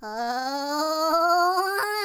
Hat Scream; MexicanaChillitime; ClubFusion

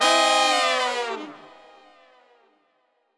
Game Over; ClubFusion; CashBomb; CashGrab

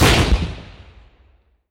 Bomb Explosion; CashBomb

